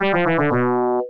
lose trumpet

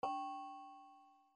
bell

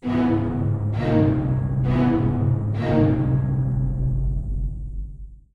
march; Scuzzane

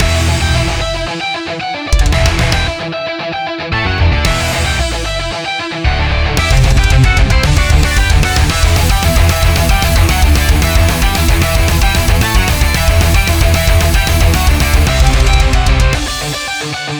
boss battle metal opening

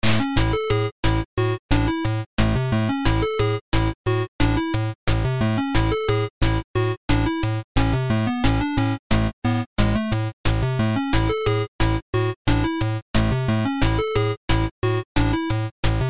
PSG 8-bit Loop

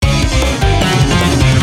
jrpg battle intro

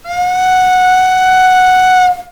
steam whistle

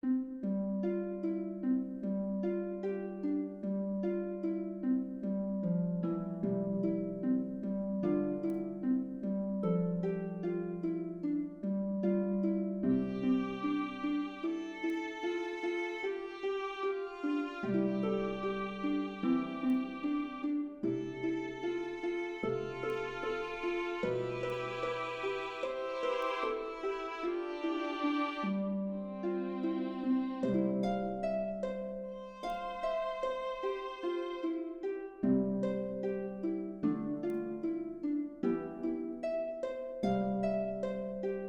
Peaceful Scene

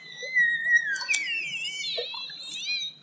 dolphin cry

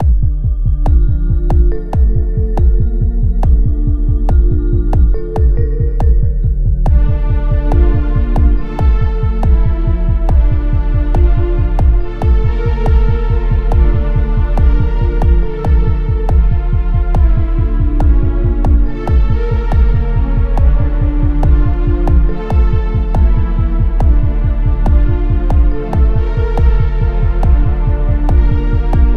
dungeon mystic

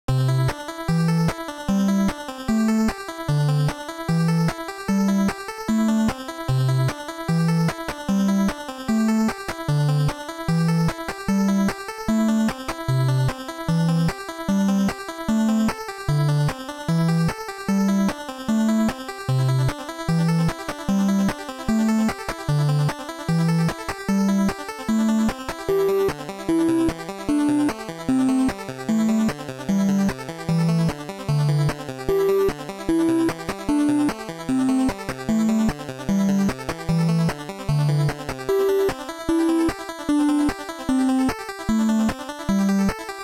pentatonic

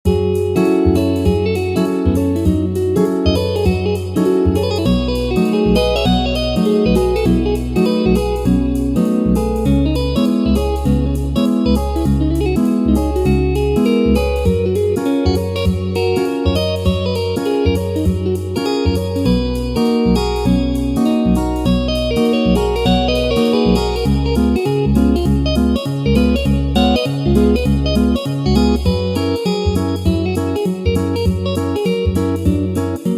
Rewind Guitar

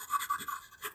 pen scribble, writing scribbles

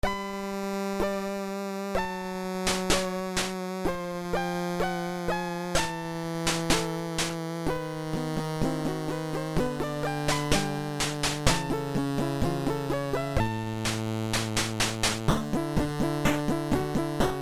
roll credits

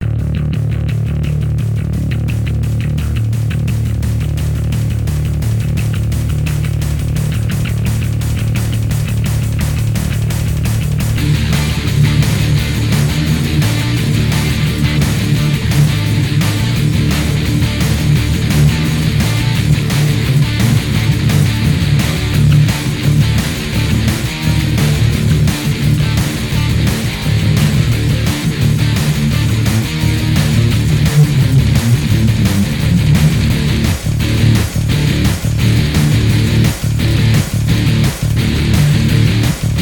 fight theme metal

